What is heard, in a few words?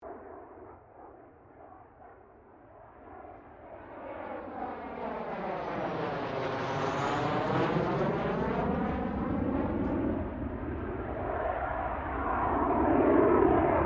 AIRCRAFT CA-27 SABRE PASS STEREO